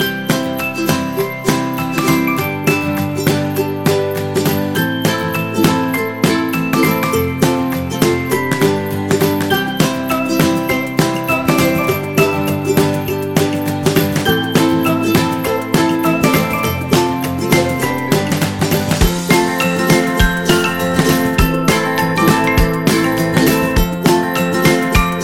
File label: Inspirational Folk